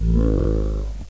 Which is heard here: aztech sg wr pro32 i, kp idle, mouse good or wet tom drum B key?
kp idle